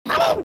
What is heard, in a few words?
Cute Grunt